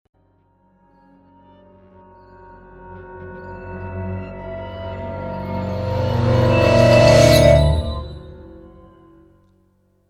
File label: ghost clusters